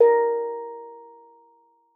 steeldrum A#3; Xsample VOL-4

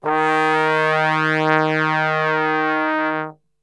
D#1 Brass Super Section; Best Service; TRMBSTRGHT; 90 s Sample CDs

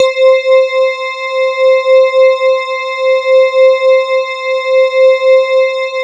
E-HARP, DX HARP; Wizoo - Powered DX

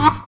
HARMONICA

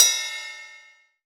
RIM CUP RIDE, MONO RIDE CYMBAL

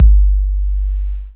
KICK, Hip Hop

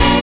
SYN, DANCE SYNTH

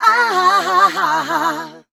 f mel e flat, fem Vocals

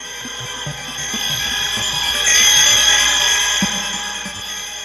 Ambient FX, Ambience FX

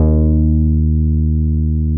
D#3, Boom da Bass; E-MU Producer Series Vol. 6 – Analogia Project (CD 1)

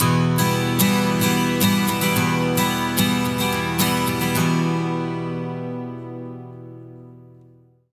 110 MIN 12 STR, Acoustic Guitar Loops; USB Soundscan vol.04 - Electric & Acoustic Guitar Loops; AKAI

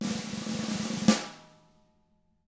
SNARE ROLL

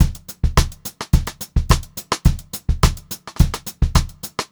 BEAT, Funk-Groove Drumloops

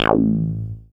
SWEEP BASS; Masterbits; Dance Trax

